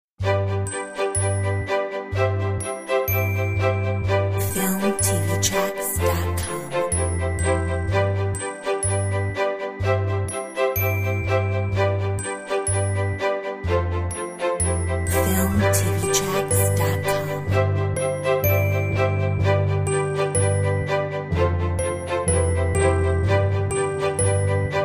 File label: Corporate style